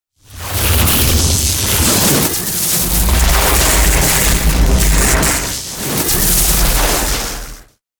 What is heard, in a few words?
Electrical Storming